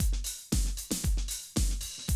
Classic Drum Loops

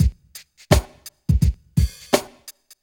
drums 2 85 L; Hip Hop; E-MU Formula 4000 Series Vol. 1; Hip Hop Nation; TriggerThis! 85

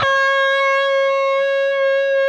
PRS D, Guitars, LEAD; Spectrasonics; Hans Zimmer Guitars VOL-1

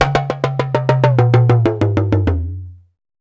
JU JU, African Contemporary

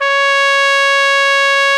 C#5, BRASS; AKAI S-Series CD-ROM Sound Library VOL-8

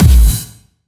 Distortion Kicks, Bassdrums

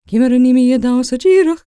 GAELIC, World Voices, GAELIC FEM

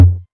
Tom Lo, Novation Drumstation

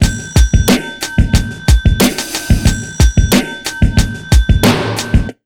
BEAT R, Pure Electro; USB Soundscan vol.03; AKAI

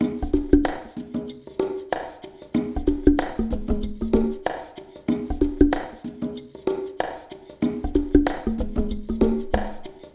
AF SHUFFL PRC MX, Liquid Grooves; Spectrasonics

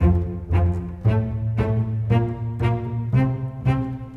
Bass Cello

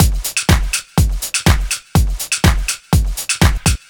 123 Minimal Funk Full, Drum Loops; 123bpm; Nu Dance Electro

